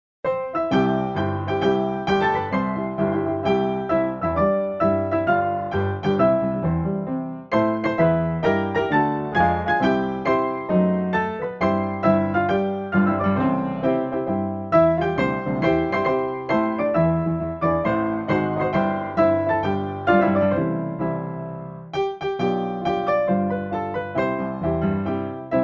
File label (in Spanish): himno de Mexico deprisa piano